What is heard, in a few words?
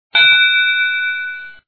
ring bell